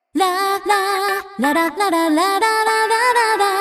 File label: Melody